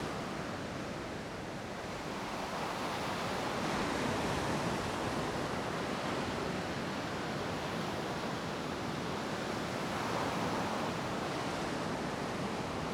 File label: ocean wave atmos